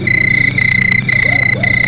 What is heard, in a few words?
crickets